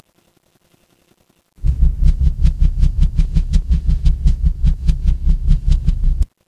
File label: mechanical engine